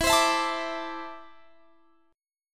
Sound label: E 7th Suspended 4th Sharp 5th, Guitar, Standard; E7sus4#5 strummed; x,19,22,21,22,22; JGuitar